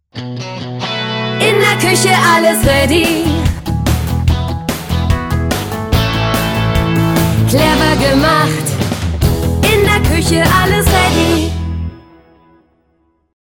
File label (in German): Jingle Kuechen; Reddy